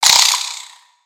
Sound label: Spider Get Hit